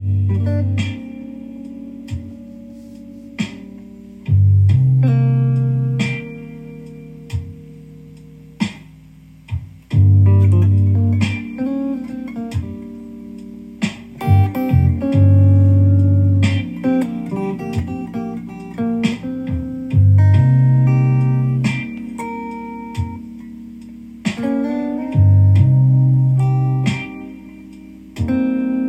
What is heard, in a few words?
Blues Gmin; Blues with Quist